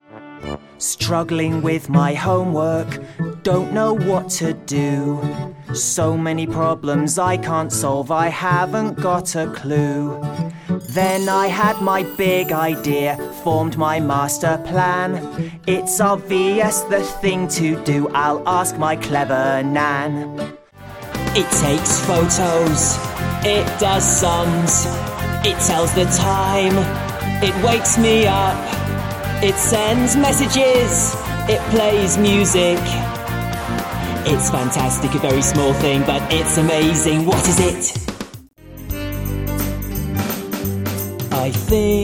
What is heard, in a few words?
teenage singing